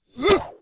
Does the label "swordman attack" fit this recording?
Yes